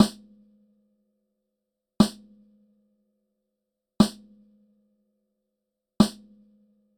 snare dual mono, Dual Mono with right delayed 10 samples which at 48k sample rate equals about .2 ms; Haas effect